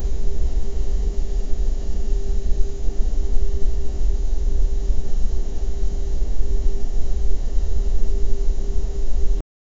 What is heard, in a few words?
very low volume unsettlin